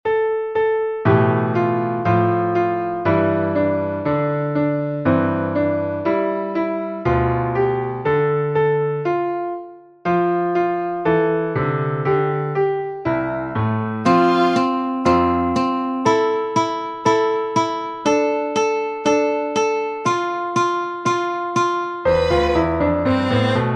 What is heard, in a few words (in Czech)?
piano housle ukulele